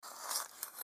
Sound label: MedicalScientific; Soundfx III